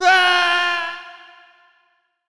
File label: Male Fall Scream